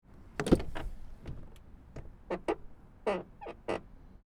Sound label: car door open external squeak; spoteffects